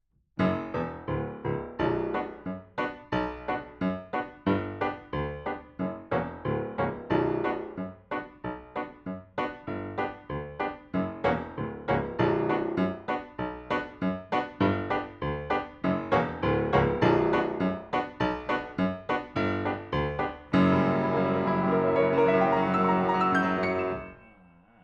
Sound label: PIANO